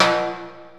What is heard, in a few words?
timbale lo; realdrum